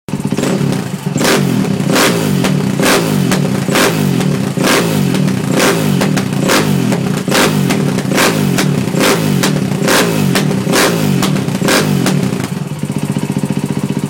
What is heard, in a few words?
KTM 450EXC sound